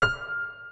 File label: piano note